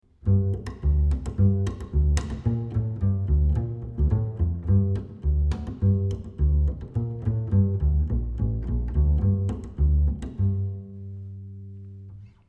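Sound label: acoustic bass Plucked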